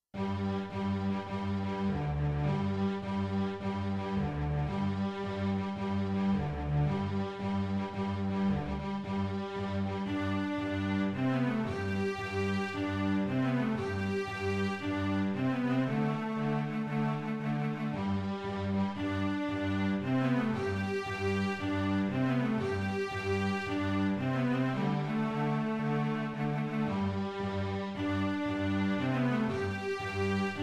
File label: strings